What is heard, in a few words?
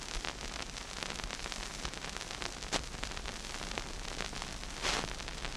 Vinyl Old 78, Noise